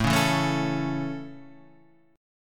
A Major A Guitar Half Step Down, chord

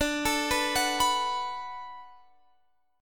D 6th D down Guitar Open F, chord; D6 arpeggiated

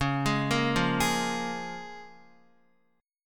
Db 6th Db, down, Guitar; arpeggiated

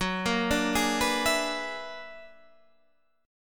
B-Minor Major 13th

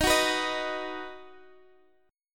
D sharp Minor Sharp 5th D sharp; 4G,5C,5E,5A; chordshape

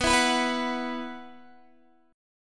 B Suspended 4th, Guitar, Open D

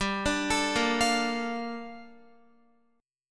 G Minor 9th Guitar; Gm9; arpeggiated; x,10,14,14,10,13

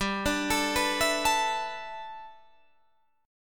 G 6th Add 9th, Guitar, Open D

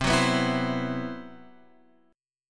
C Major 7th Flat 5th C; CM7b5 Chord {8 9 9 9 X 8} strummed

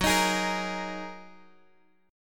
Gb 6th Add 9th Gb down Guitar DADGAD

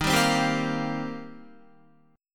Eb 6th Add 9th, Guitar; Eb6add9 strummed; JGuitar

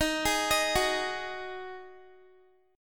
D sharp Minor Double Flat 5th, Guitar; arpeggiated

